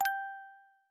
Music Box, notifications; OnePlus 12

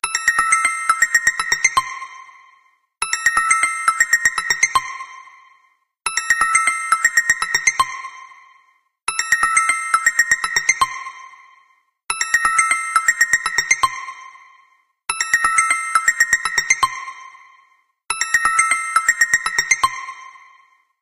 Ring Synth

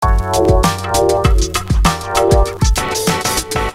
Hiphop alarm

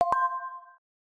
Charging Started

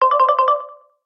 calendar alarm; Jolla; The Other Half

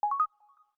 Send key, Samsung system; Samsung SCH-M710 T Omnia II

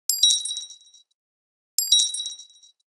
Coin, phonetones Nokia N8

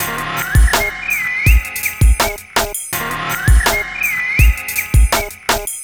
ringtone, RnB, bird loop; Google; NewWave Labs

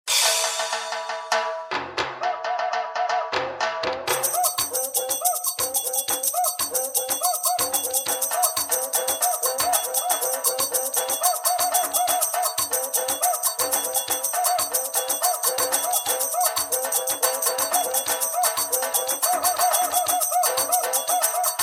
Samba; Samsung GT-M2310

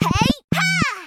notification; OnePlus; 11 Genshin Impact Edition; xiangling

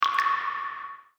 Waterdrop (On); LG GB255g; Event sounds

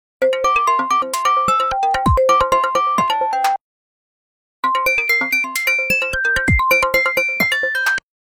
Beep beep, phonetones; Sony Ericsson; C510

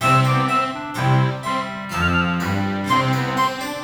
Classical, ringtones; Google; NewWave Labs

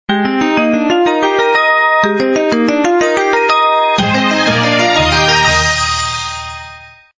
Tone, phonetones; HP iPAQ Glisten

